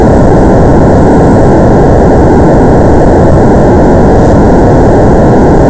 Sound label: pager